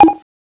lockon gun lock on beep, weapons; cstrike

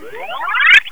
level up; gungame

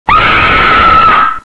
ahhhhhhhhh; cstrike; maps by loss

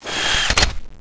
flamegun draw, weapons; cstrike

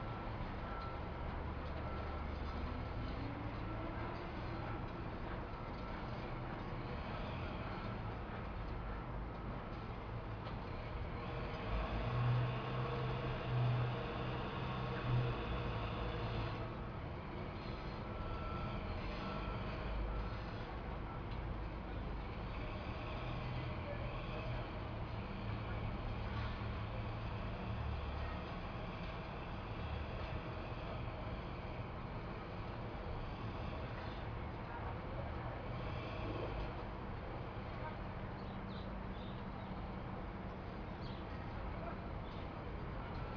industry

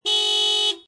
car horn; papirkurv

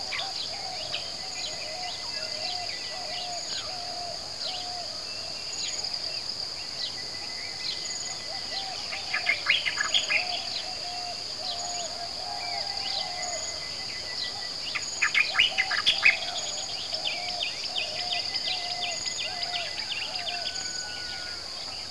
chirpin; cstrike; temple3

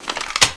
firecracker draw; cstrike

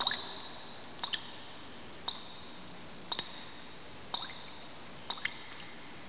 water drips; cstrike; stb canal

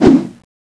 slash, weapons; jailas lazda; cstrike

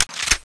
clip in, weapons; kronos1

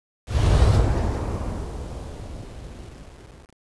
gatling amb; weapons; gatlingex-2; cstrike

